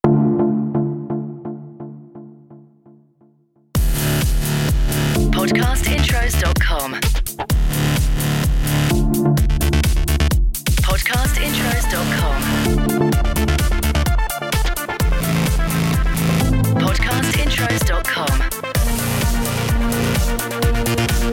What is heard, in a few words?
Podcast CORPORATE Kick Start; Watermark